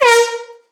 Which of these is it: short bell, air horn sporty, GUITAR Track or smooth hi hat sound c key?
air horn sporty